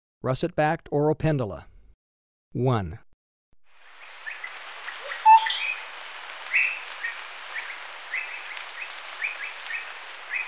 Russet-backed Oropendola, Birds of Ecuador; S. America

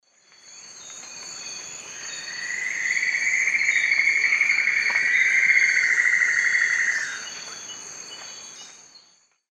Dendrexetastes rufigula, duet; S. America; Eastern Lowlands

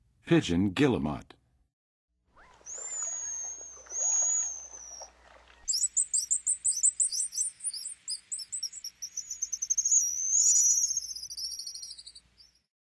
Pigeon Guillemot; North America; Western USA; Western USA Songbirds

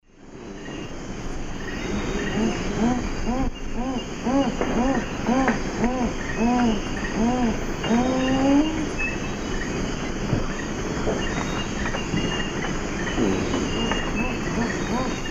Tigrisoma lineatum, song; S. America; Eastern Lowlands

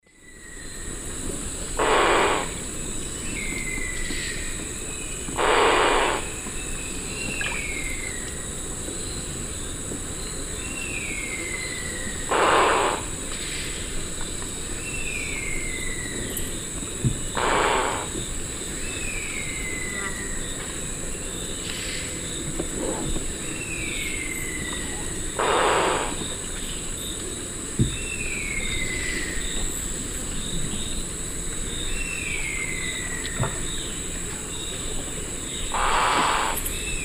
Crotophaga major, call; S. America; Eastern Lowlands

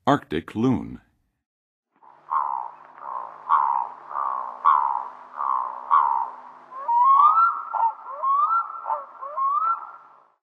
Arctic Loon; North America; Western USA; Western USA Songbirds

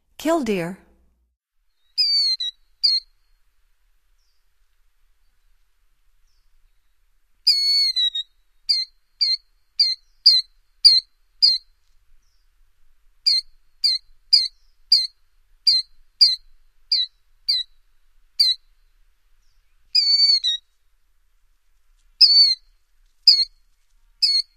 Killdeer; North America; Stokes

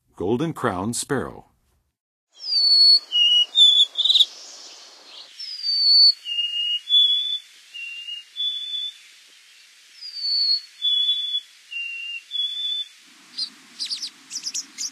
Golden-crowned Sparrow; North America; Western USA; Western USA Songbirds

